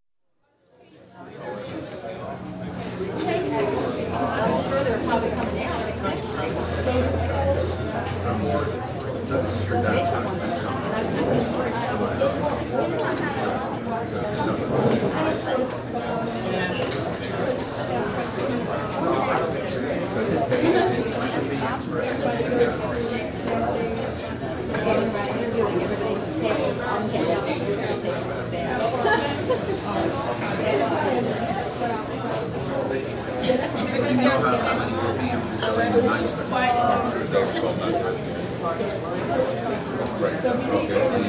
Philadelphia: Lunchtime atmosphere in delicatessen restaurant; Restaurant Sound Effects; America; BBC